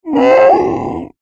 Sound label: Monster Dinosaur Bird Groan Moan Wounded; Beast Creature; Animal; Dinosaur Sound Effects